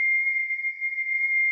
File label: BRAKES LOW, Vehicles F1; AOS F1 2022